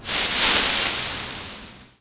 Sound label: leaves